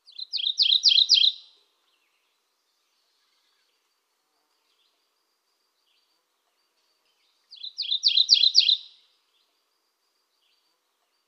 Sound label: Mourning Warbler